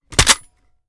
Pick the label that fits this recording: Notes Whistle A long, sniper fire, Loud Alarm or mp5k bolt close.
mp5k bolt close